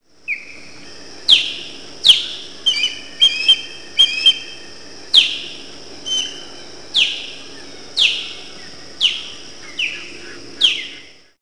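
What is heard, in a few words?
osprey, Birds of Prey; Know Your Birds of Prey - Vultures to Falcons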